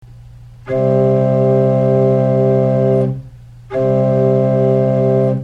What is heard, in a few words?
c cord, organ